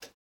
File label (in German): blinker on, volvo 9900; Vehicles; AI volvo 9900; Freyfurt